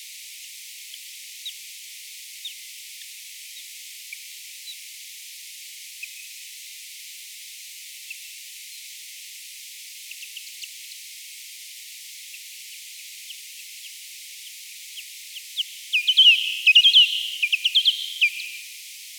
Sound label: B barbastellus social; Corrèze; M-Barataud; Acoustic Ecology of European Chiroptera